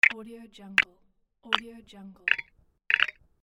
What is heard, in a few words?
minimal mechanical menu buttons